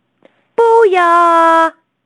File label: booyaaa